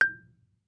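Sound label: Marimba rubber fortissimo G4; MIS Pitches - 2014; Percussion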